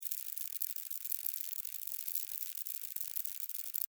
Millipede Walk, Millipede, Walk, Movement, Insect, Bug; Millipedes; Animals:Insects; Blastwave